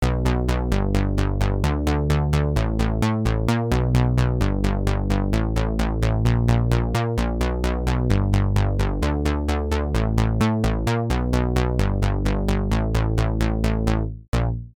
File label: synth